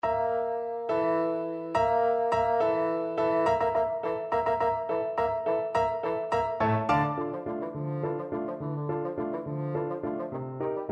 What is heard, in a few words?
danse, bassoon